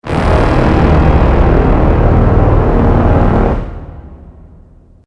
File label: Synth, Strings, Stab, Horror, 5.1